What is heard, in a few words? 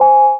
KEY Hold Finish